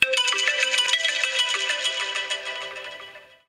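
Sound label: IPhone 12 Apex IOS14, ringtone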